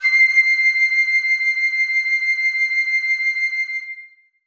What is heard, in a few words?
piccolo c6; Sonatina Symphonic Orchestra